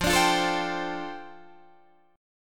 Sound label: F 6th Add 9th F down Guitar Open D, chord